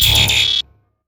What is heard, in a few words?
heat seeking missile; LoL; urgot